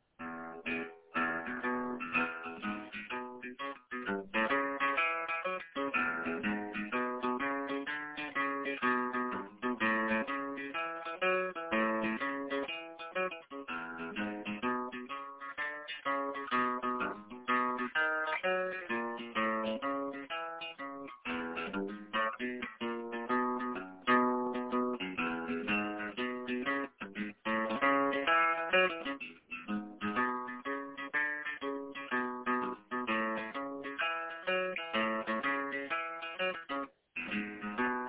blues bassline on gtr

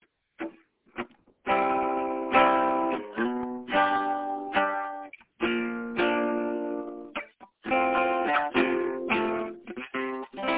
outline rock ballad chords

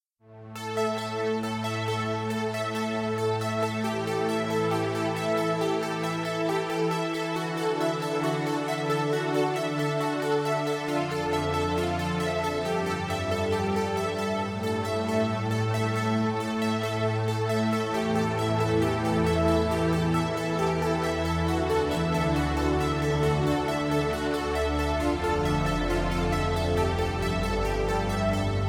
suspense

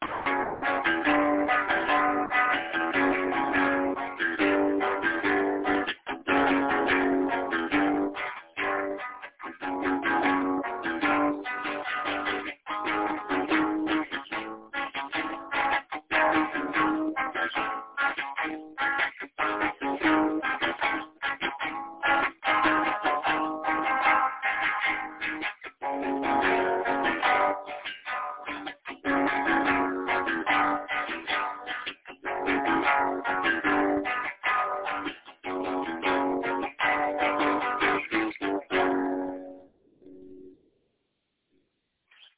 deep off beats chords

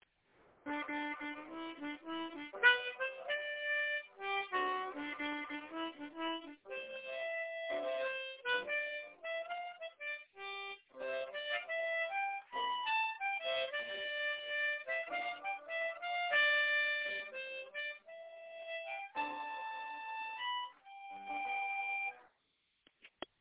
a melody; golly shucks

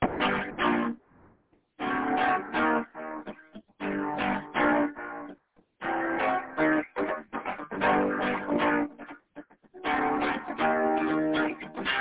rockus chordus interruptus

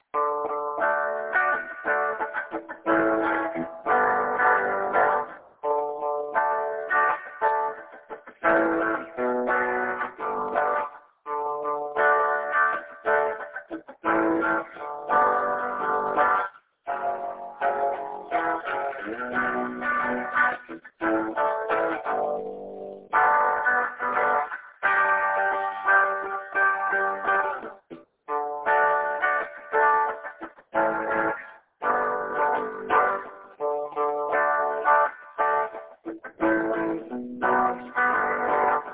slow ish anthem rock with a harmonic twist

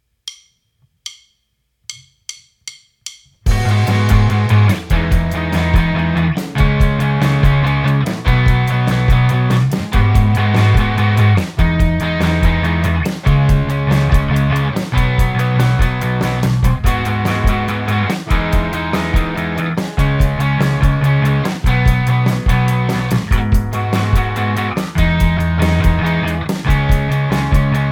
bass remix

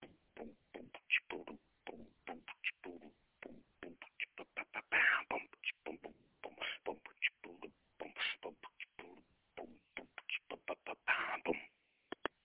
vocal blar beat

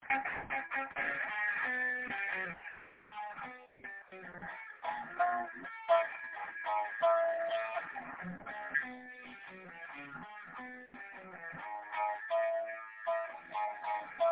urgent metal rhythm lick

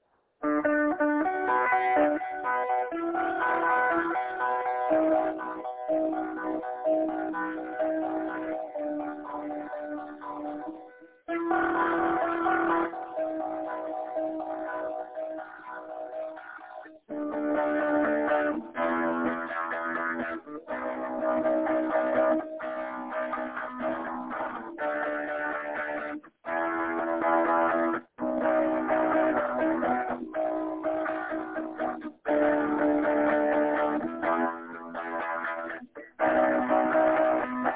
resolving arpeggios and chords

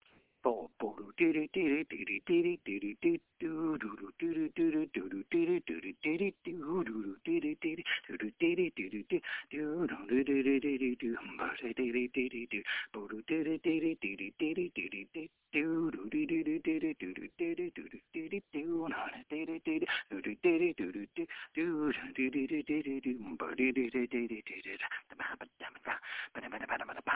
extreme pop bassline rap

